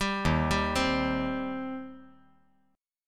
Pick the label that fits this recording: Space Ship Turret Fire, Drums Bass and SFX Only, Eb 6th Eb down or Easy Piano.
Eb 6th Eb down